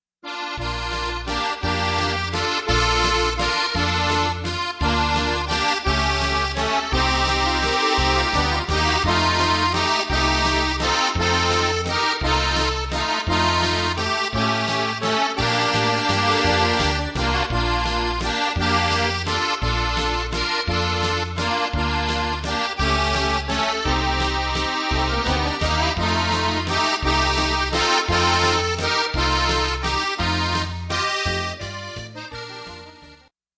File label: European Waltz